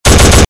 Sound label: m2 50 loop